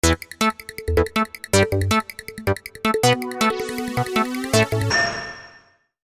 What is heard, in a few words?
Techno Tag; Novell